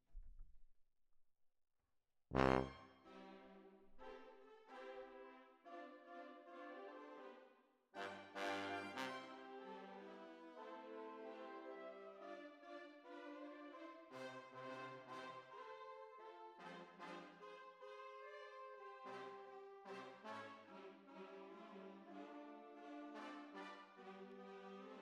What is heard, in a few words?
Tuba, jazz for piano